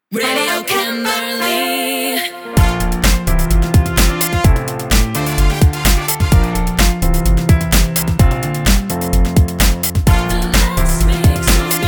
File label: Jingle; Radio Camberley; Ramp